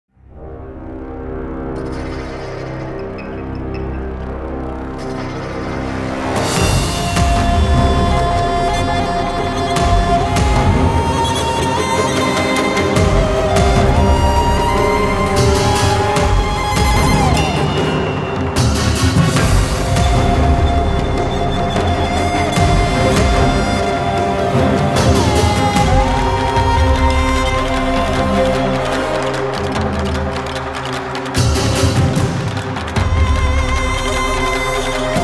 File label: pulse waves; bright memory infinite